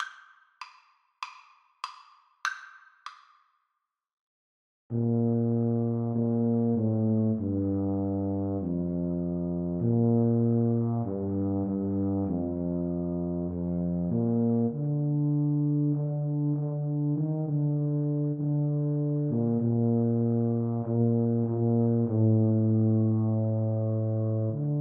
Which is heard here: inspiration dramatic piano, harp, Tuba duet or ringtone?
Tuba duet